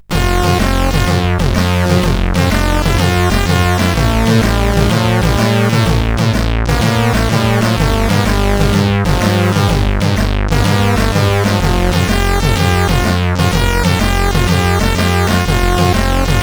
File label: Beaty intro; CSG8580R5